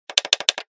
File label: CD player button press multiple times